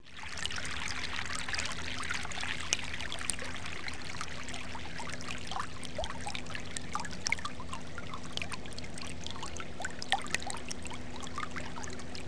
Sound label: flatcreek